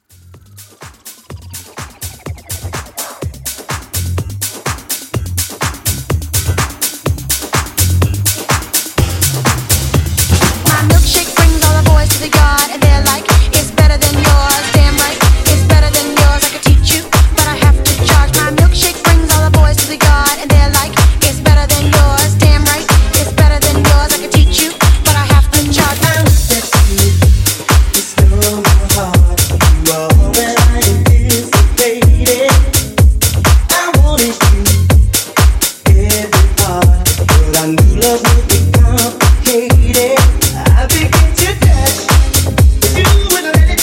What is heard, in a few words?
House Blend